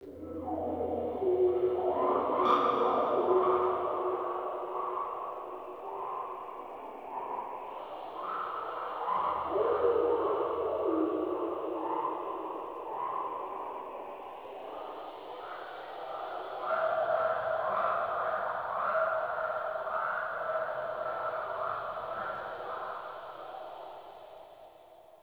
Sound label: Infested Eris Exterior Critter Loop